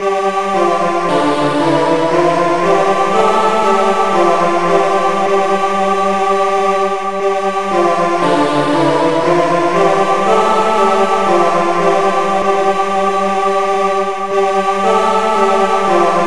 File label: chant